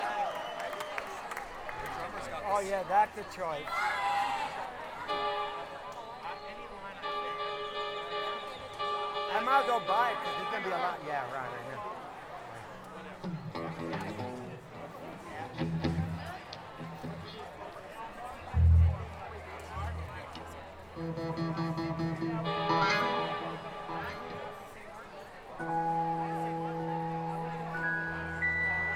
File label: Tuning